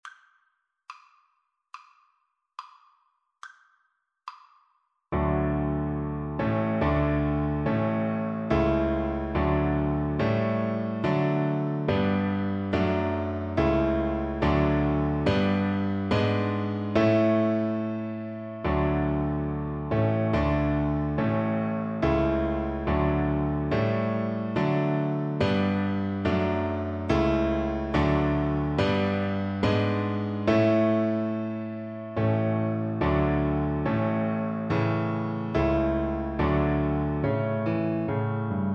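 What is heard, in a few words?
German national anthem violin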